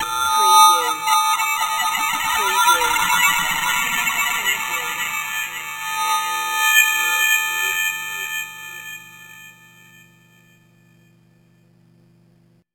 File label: Short n Evil